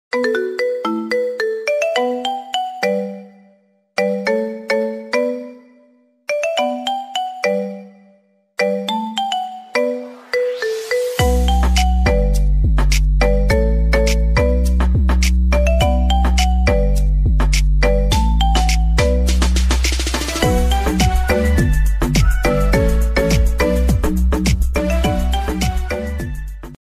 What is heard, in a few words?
Marimba